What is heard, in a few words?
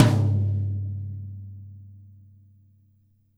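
Jazz kit tom, Drums Acoustic; USB Soundscan vol.10; AKAI; JAZZKIT 2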